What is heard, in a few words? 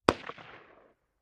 Ak47 Machine Gun Sing